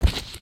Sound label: soft, human step